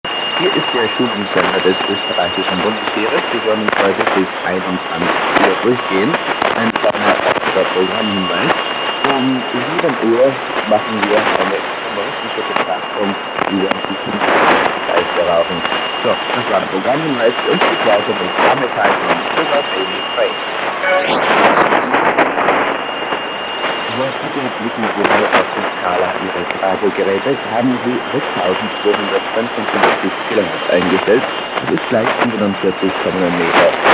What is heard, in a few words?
Austrian Army Radio Stn